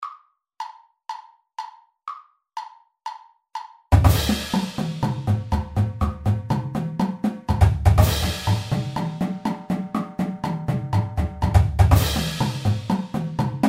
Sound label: tap madness click